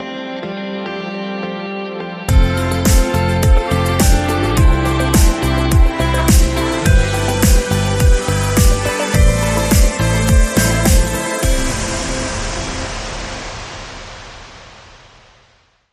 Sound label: Bumper